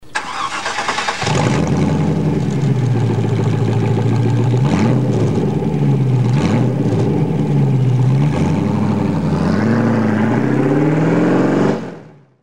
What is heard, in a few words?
morgan car start